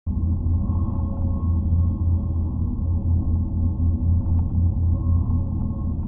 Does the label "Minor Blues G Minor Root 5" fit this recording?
No